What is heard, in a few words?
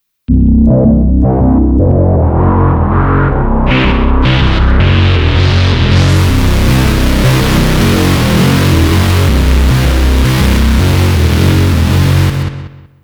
Terror Bass; Pro-12